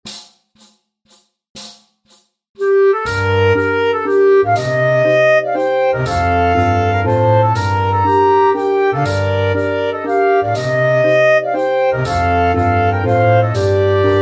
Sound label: Mazurka; Mazurka a Susanne